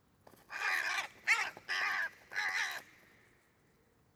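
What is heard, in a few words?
hooded crow corvus cornix cornix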